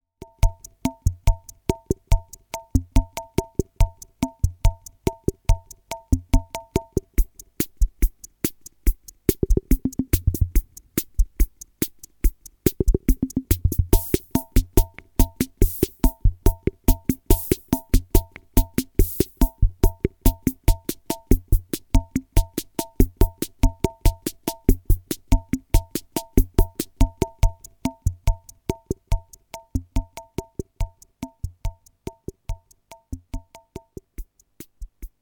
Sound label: rhythm80 var chacha